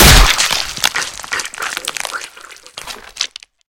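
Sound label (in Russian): взрыв с вытекающим содержимым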